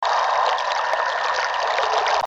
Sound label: Static Water; Myst